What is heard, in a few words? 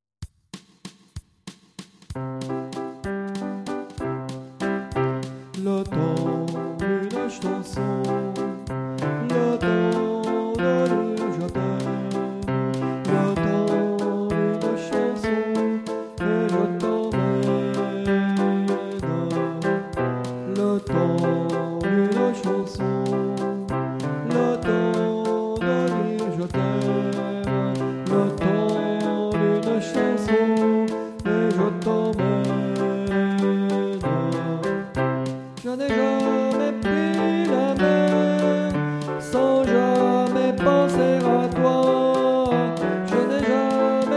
tenor